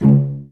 CL PIZZ C1; Best Service; Peter Siedlaczek's Advanced Orchestra

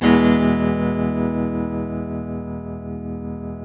TELE CHORDS MAJ F, Guitars; Spectrasonics; Hans Zimmer Guitars VOL-1

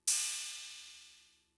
Cymbal; Fricke MFB-522

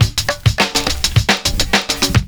PERCS, 70 s Breakbeats

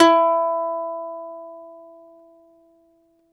BALALAIKA, Prima Balalaika, Plucked Strings

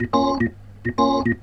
Roots Reggae, B-3; Sounds Good - Roots Reggae

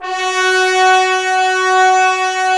BRS, Roland LA Composer; Tbns

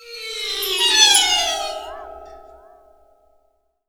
waterphone

